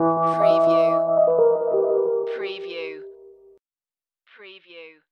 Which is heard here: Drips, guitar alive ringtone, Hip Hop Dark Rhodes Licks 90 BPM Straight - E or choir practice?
Hip Hop Dark Rhodes Licks 90 BPM Straight - E